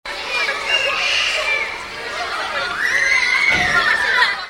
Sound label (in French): Ambiance cours d'ecole; bruitage